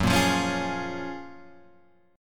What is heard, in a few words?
F sharp Major 9th F sharp, Guitar Open G